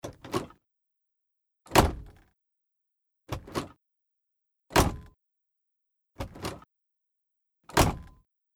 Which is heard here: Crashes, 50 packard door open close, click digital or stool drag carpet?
50 packard door open close